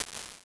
grain verb; Convolution; NI Kontakt 5

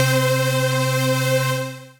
pad, synth pad

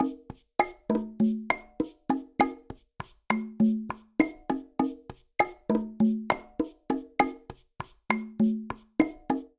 Bossa Nova-100bpm, Latin Percussion; Ableton; Factory Packs

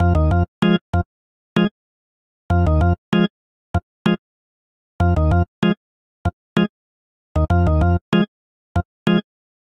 Vamp Dub Organ F Minor 96 bpm; Electric Keyboards; Ableton; Factory Packs

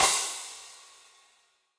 Crash FX 17 Bright Room, Cymbal; Drums; Drum Hits; Standard Hits; Session Drums Studio

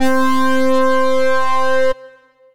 pad, synth pad

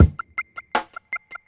080 Reggae Drums; World

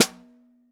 SN1 Bottom, snare

